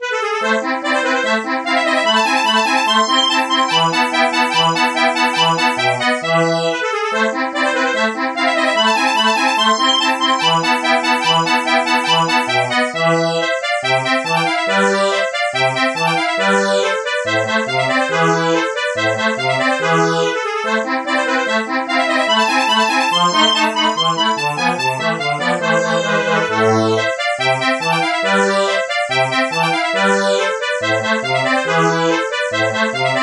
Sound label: accordian mozart